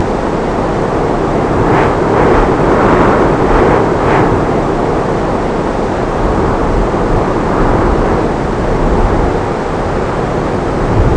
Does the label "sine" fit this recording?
No